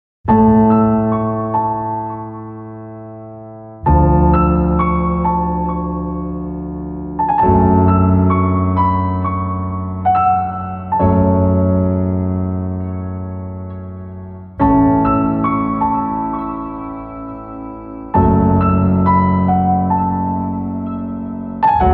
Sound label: Cinematic piano